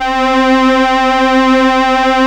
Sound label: SIEL, String Machines